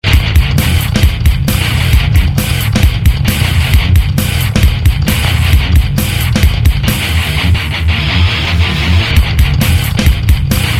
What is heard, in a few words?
metall